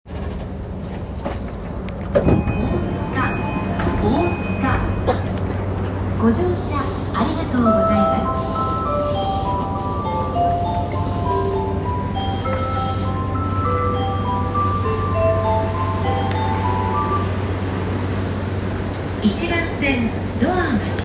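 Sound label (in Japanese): 山手線大塚 counterclockwise, train sounds; JR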